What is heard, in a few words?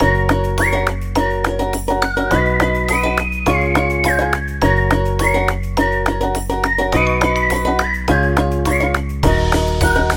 Happy Food